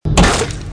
kh wood hit

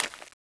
zombie ghost footstep; ghostfury

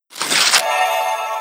pickup

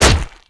Double Throw hit; d2lod

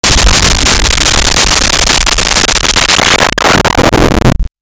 laser

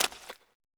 zombie ghost footstep; ghostfury

